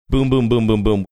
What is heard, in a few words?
boom boom boom boom boom